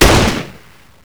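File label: Kar98 fire; WAR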